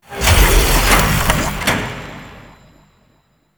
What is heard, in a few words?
Cursed Chest Unlock; Warframe; Duviri Murmur; Cave Combat Encounter